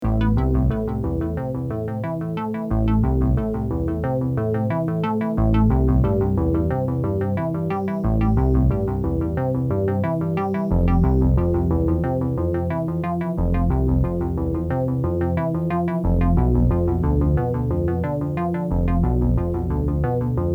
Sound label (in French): vie organique moog; Loop Mania Sampler Pack